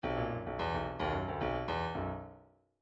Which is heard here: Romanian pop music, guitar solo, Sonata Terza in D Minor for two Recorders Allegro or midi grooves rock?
midi grooves rock